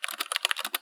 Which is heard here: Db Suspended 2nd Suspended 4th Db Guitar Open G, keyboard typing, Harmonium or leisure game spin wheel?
keyboard typing